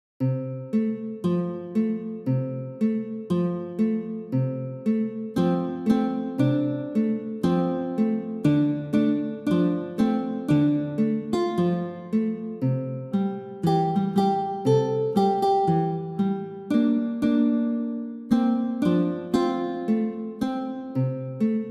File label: country road violin